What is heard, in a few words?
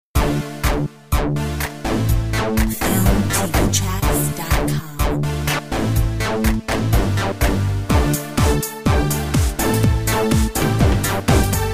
Teenage pop